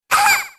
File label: hurt; snes; smas; saturn